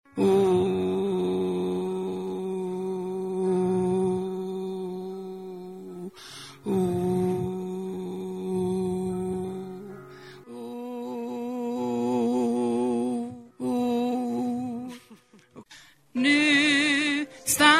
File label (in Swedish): bas, stammor